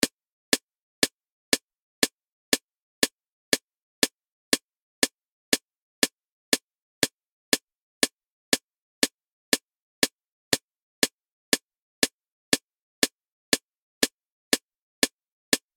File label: sound test; Funkin